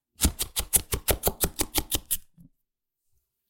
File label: Fast Chopping; soundscrate